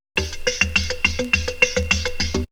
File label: Percussion; AMG - Coldcut's Kleptomania VOL-1